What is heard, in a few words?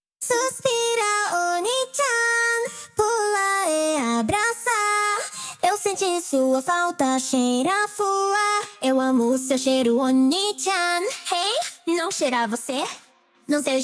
Vocals